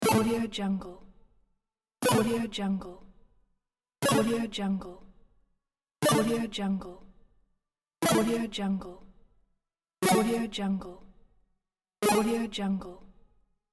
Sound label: chip sound